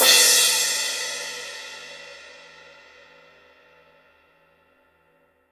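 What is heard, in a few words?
CYMBAL, real drum kit; HIPHOP KIT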